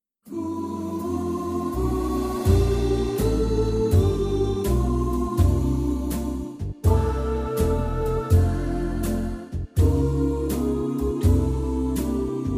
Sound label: Vibraphone Separation